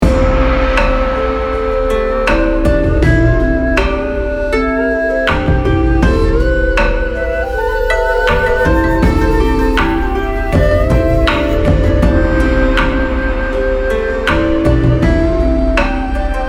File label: moon cake melody